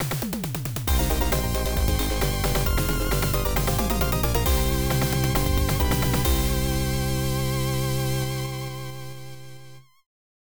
Game clear; rusty ms-dos

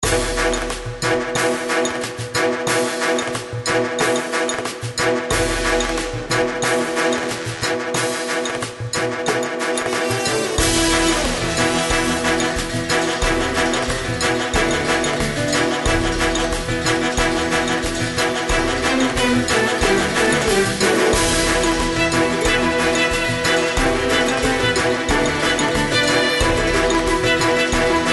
Music Game; Royal Offense; android

